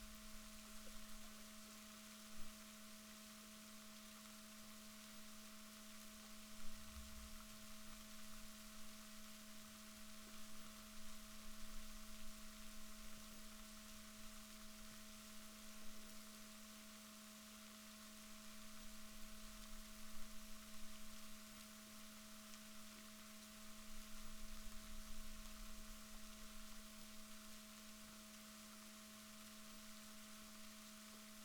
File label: rorquals positif, Balaenoptera Physalus Chile